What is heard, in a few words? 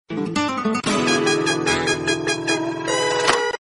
mexican guiter music ringtone